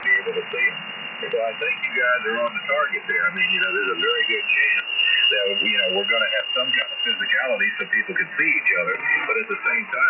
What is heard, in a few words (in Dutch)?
onbekend LSB, Onbekende stations HF; 3.905.00 onbekend LSB